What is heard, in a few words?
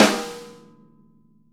VINT KITS; Sonic Reality - Vintage Timetraveler